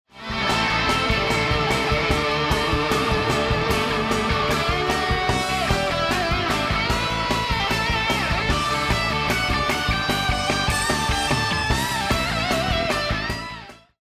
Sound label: choose team; music kits custom